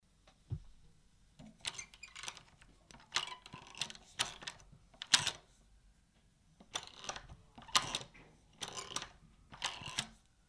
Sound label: Door Knob